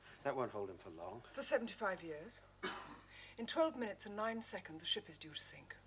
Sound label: cough; Sapphire and Steel; The Man Without a Face